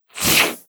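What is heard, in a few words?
Electric ball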